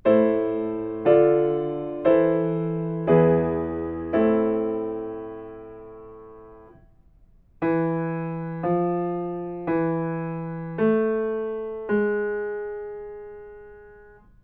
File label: melodic fragments; ear training